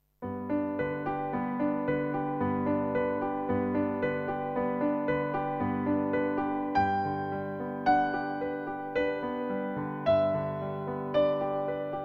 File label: Solo piano version; The Carnival of the Animals - XIII. The Swan